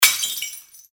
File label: glass breaking